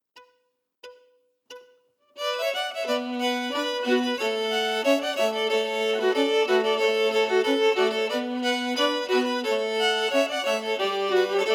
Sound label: St Patrick An Dro harmonies emphasized